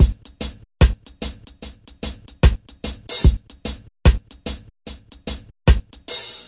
074 bpm reggae kick hat snare

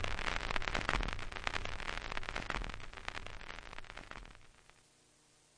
dirt; Atmospherics

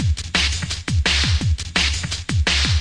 170 triple stepper; 161 to 180 bpm

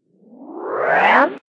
reverse mm; percussive; REMIXABLE COLLECTIONS; Ze Franks Pain Pack; pain pack percussive and bips and clicks

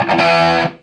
EMU SP 12, drum machines; Kult

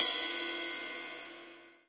classic hh Ride; Hip Hop; STYLE KITS; Classic Hip Hop Mega Kit

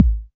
SC40 BD, Pearl SC-40 Kicks

